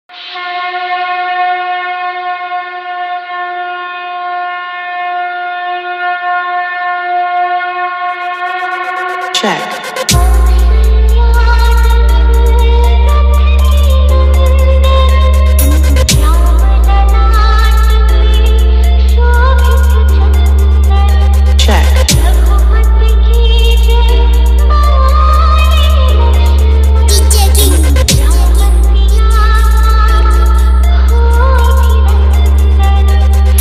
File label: Sound Check Full Vibration Mix Hindu Song